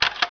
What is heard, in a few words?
slide; Microsoft Graphics Studio; Home Publishing 99